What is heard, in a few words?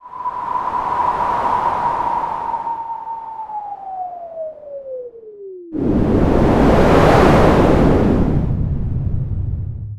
resonator